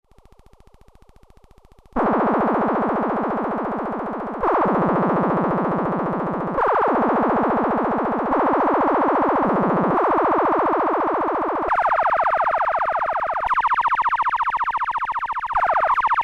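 Cross Mod VCO, Minisonic2 Analogue Sound Synthesizer; G.D.Shaw's Minisonic 2 synthesizer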